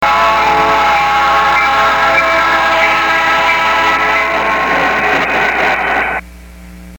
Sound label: train crossing bone direct good speaker; stock bone direct goodspeaker